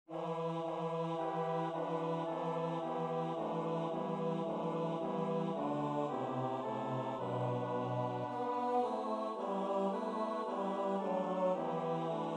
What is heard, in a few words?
bass; God Rest Ye Merry